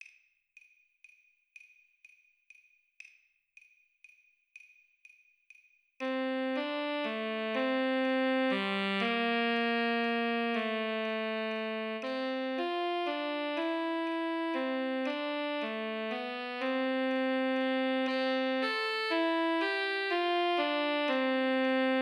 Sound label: Tenor Sax Play Along 40 BPM